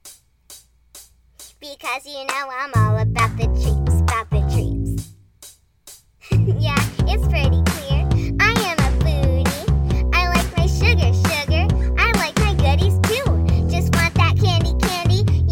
Singing Christmas Toy

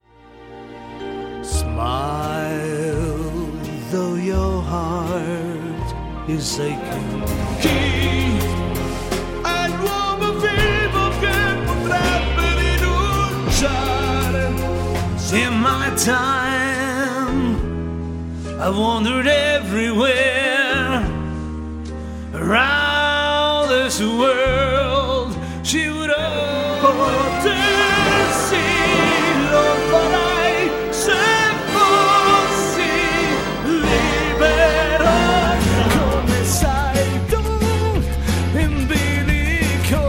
singing

